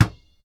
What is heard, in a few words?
Basketball